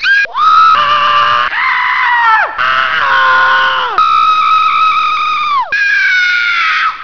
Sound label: SUPER SCREAM